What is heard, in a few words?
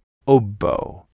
oboe